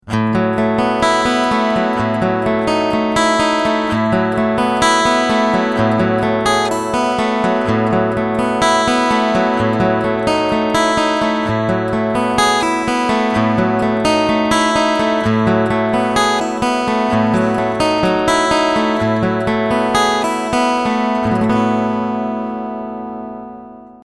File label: Cool Tube Picking